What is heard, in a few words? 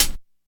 damped pedal hi hat sample g key